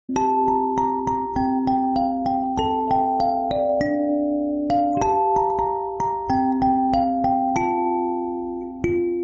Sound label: kalimba ringtone